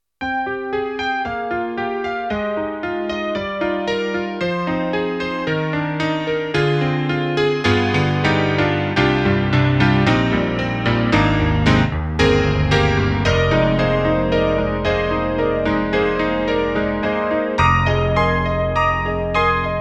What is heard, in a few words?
Stage Grand; Roland; SRX-07; XV-5050